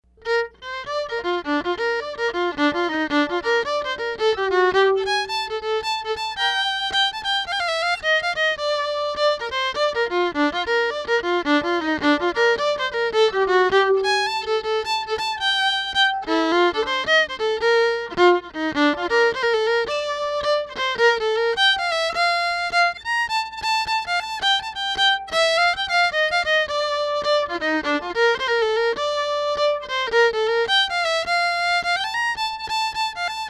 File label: Jig Bb